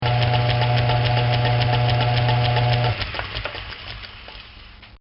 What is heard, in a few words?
Lathe Out Of Gear, Start And Stop